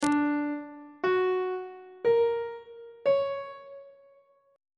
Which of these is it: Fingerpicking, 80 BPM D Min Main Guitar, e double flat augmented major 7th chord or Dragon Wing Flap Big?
e double flat augmented major 7th chord